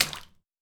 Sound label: Puddle